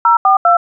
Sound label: dtmf 042